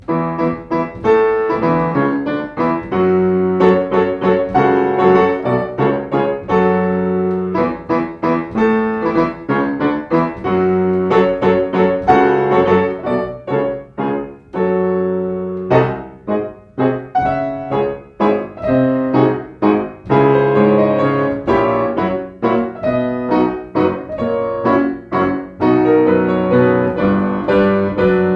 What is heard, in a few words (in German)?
Schubert Deutscher Tanz, Klavier; Yamaha Klavier